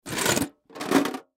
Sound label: Tin Box Turn Move, Tin Box Turn Upside Down Right Side Up; Rustling; Foley; Blastwave